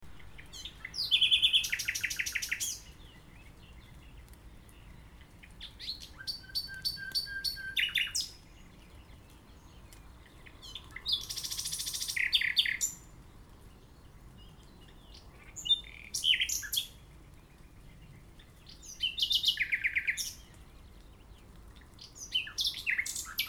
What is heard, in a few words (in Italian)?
Usignolo